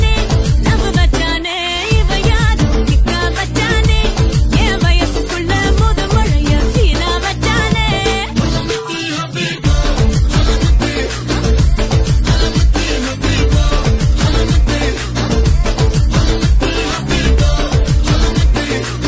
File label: Tamil Song